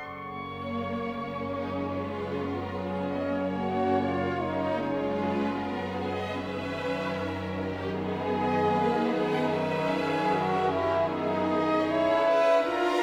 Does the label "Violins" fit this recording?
Yes